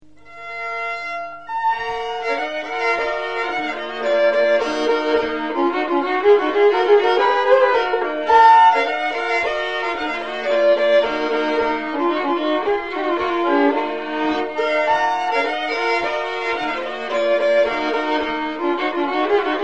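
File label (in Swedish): Näckens Polska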